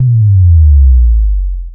Bassdrop

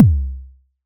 8bit kick

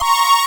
synth; osu

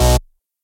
bass

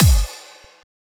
ending kick clash

